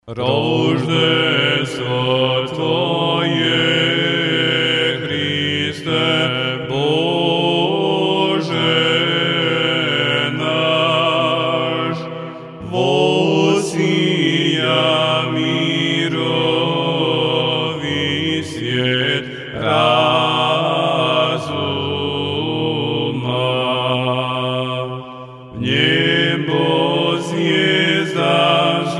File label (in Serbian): Tropar glas 4